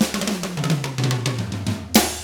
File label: 110 SAMBA, Drums; ILIO; Double Platinum Drums 1